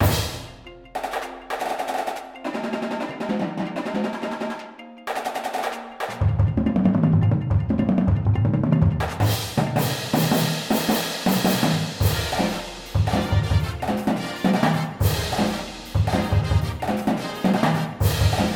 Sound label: full drumline w music